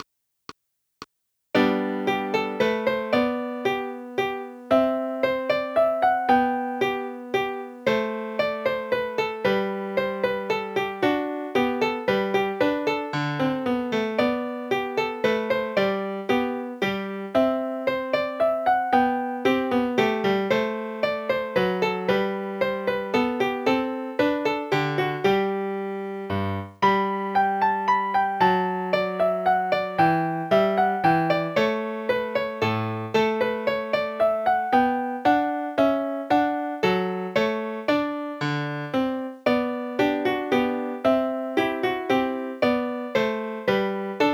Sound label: MINUET, CASIO CTK-220L; J.S.BACH